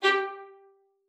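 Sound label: violin spiccato G5; Xsample VOL-5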